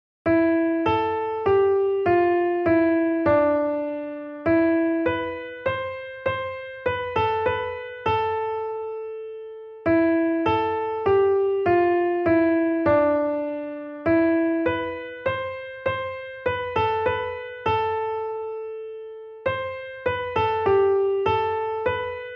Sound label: melody; o sacred head now wounded